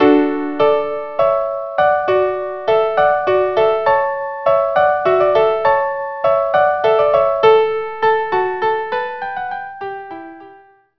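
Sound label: D Hornpipe violin viola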